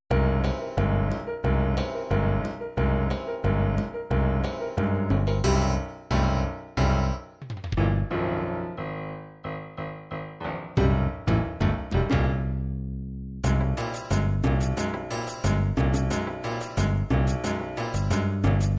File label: techno tex mex, midi